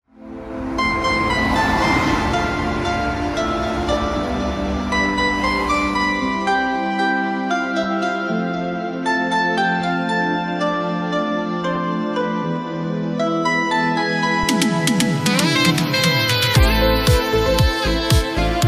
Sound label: Synth Pop 80s